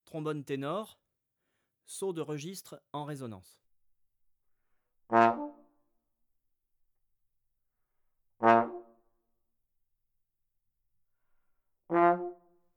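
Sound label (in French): tenor saut de registre en résonance, trombone; Effets; Effets harmoniques; traite orchestration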